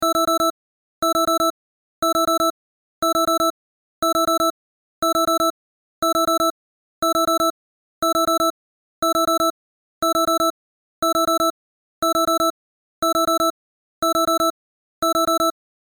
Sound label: alarm